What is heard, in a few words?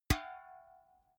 big can empty hit